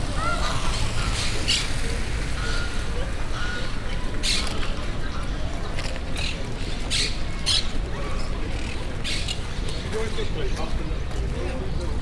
park hullámos papagájok távolabb tavi élet

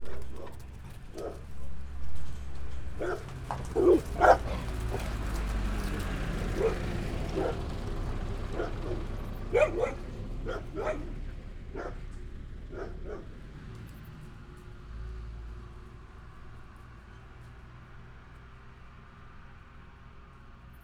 elhalado jarmuvet megugato kutyak; varboc

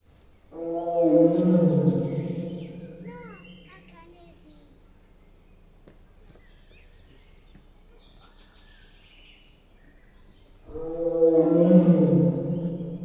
távolabbról a házban lévő nagymacska, szibériai tigris; allathangok; veszpremizoo 2013

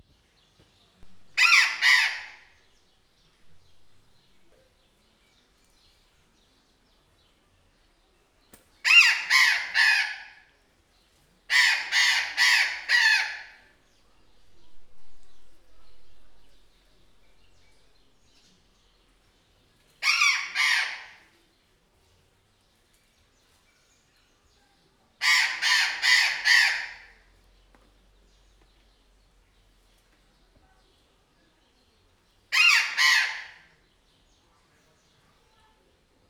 kintről jön a válasz, sziklapapagáj; jaszberenyi zoo 2015

